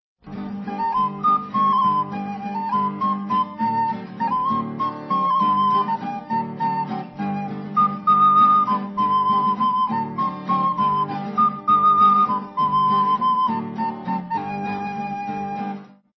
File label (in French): quand j'etais chez mon pere instrumental